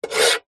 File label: office pen biro draw line on metal box